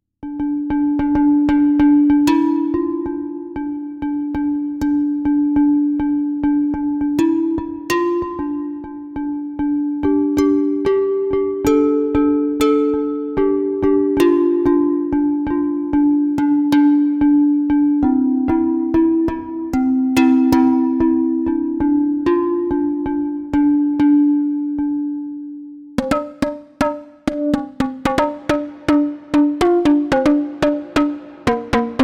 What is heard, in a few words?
Hang; Peter M. Mahr is a musician and synthesizer enthusiast from Austria living in Switzerland.